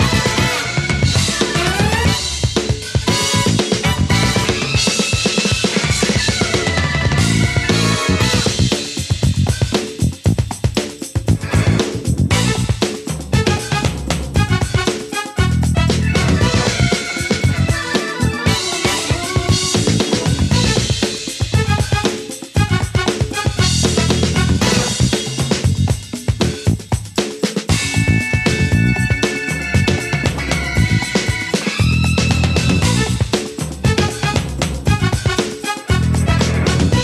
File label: music; Fantasma; riviera Star